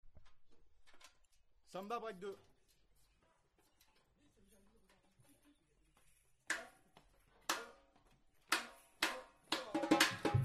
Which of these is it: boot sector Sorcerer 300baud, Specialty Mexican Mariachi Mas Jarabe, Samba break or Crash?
Samba break